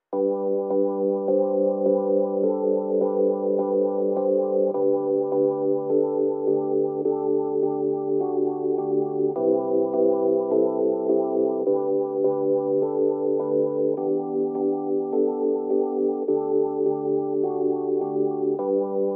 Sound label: No Drums No Bass